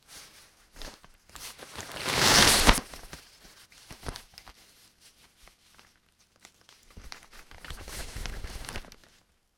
soft rustle of a paper; household

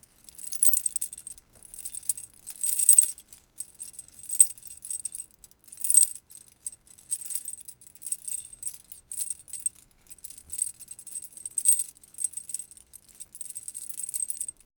metal clanging chains; industrial

